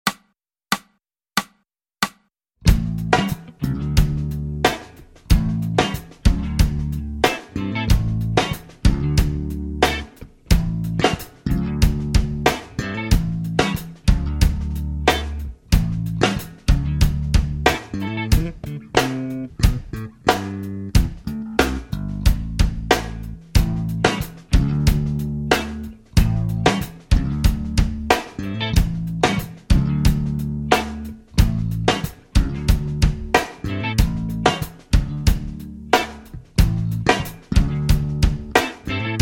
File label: Funk Bass